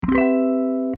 Cmaj7 down; Hylics

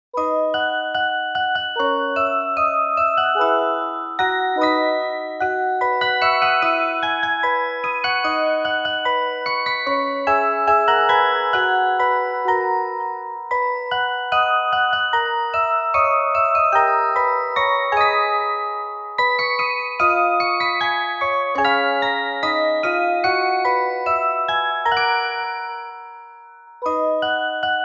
Music Box; TYPE-MOON Music Collection; Fate Tiger Colosseum; Fate-Tiger Colosseum Upper OST

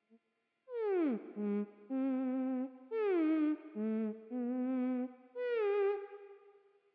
Theremin; Hylics

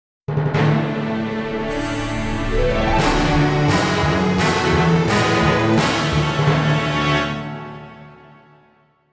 Jingle 2 (Shouri Fanfare -Great-); Fate Tiger Colosseum ORIGINAL SOUND TRACK [Complete Edition]

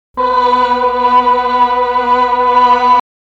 Sing; Hylics

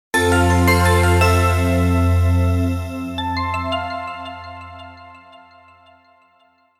Jingle; Fate Tiger Colosseum; Fate-Tiger Colosseum ORIGINAL SOUND TRACK [Complete Edition]

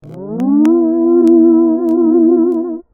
Heal; Hylics

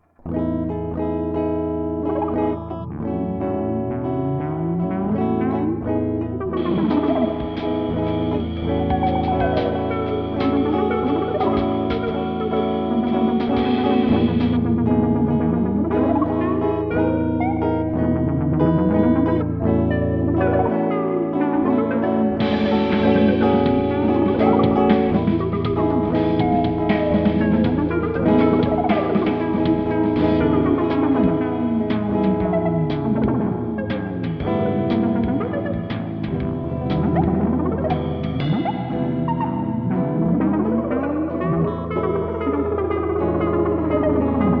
Theremin and Drums; Hylics

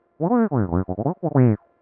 Vocal; Hylics

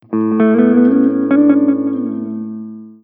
Guitar Melody Echo; Hylics

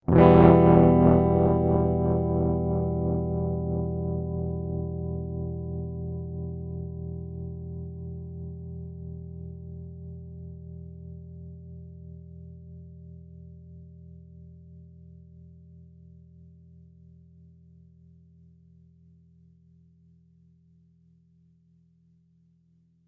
Em down; Hylics

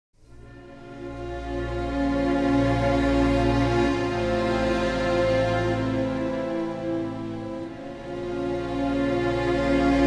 strings ver.; Fate Stay Night Soundtrack Collection; Fate Stay Night Original Soundtracks

